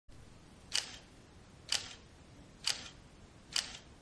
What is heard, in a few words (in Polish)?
leica m8 test migawka